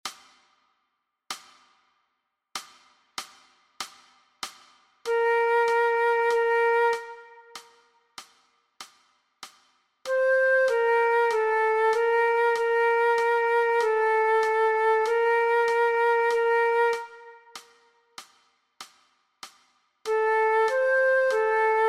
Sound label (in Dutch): Meespeeltrack Dwarsfluit; Dwarsfluit aangepast; Nij Talint Orkest